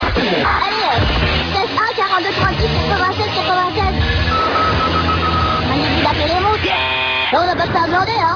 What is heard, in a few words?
telephon, simpson